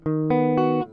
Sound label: Guitar Arpeggio